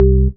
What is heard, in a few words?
DM ORG1, Dance Mega Synth